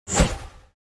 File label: Long whoosh transition